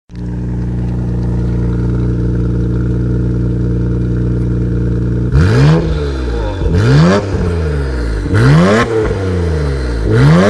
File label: R-34 Exhaust